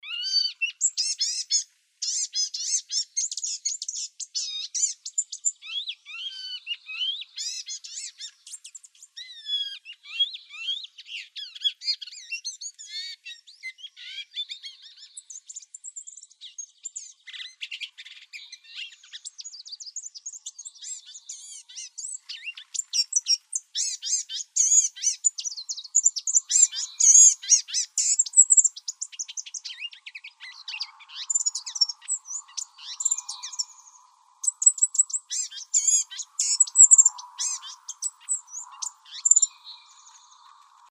Wrens Kinglets Gnatcatchers; BGGN; deviche

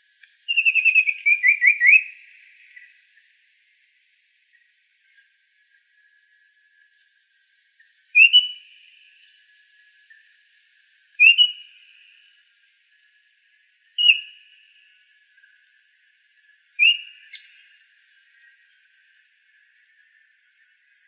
BAOR CALLS, Icterids; AZFO; deviche

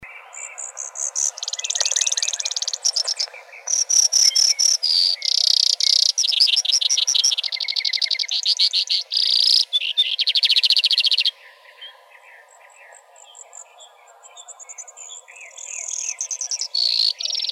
BRSP, Emberizids; deviche

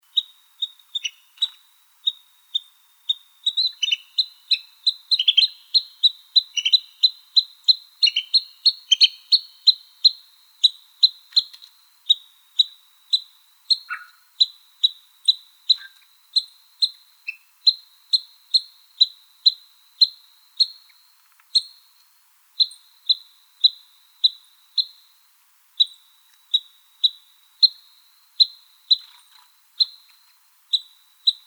Nuthatches; AZFO; deviche

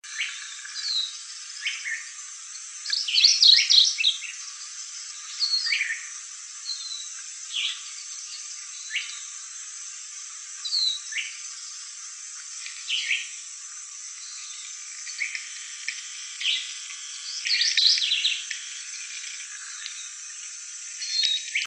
Other Warblers; MOWA; Woodley; Huachuca

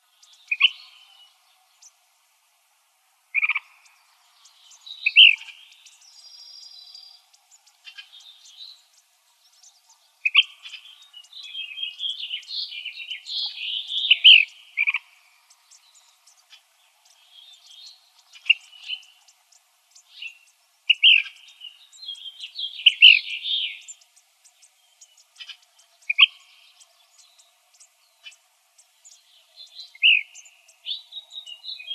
CALLS, Flycatchers; AZFO; deviche

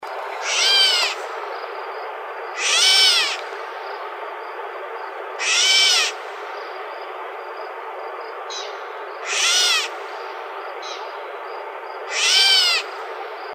GRCA CALLS, Mimids; AZFO; deviche

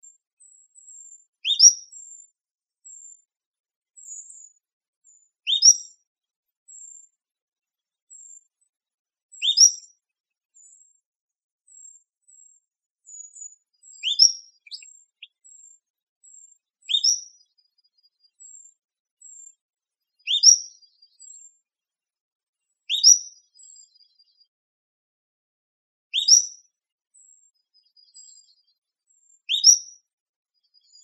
Flycatchers; AZFO; deviche

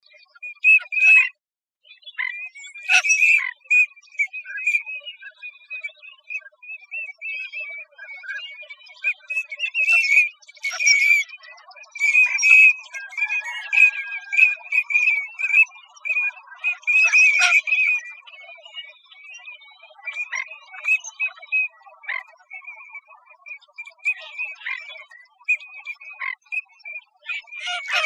Ducks Geese Swans; AZFO; deviche

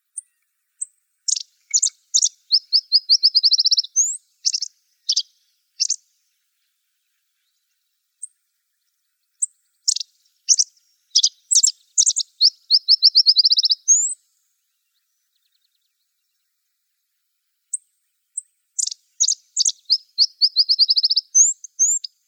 Emberizids; BOSP; deviche

